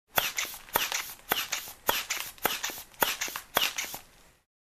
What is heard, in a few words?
Pumping air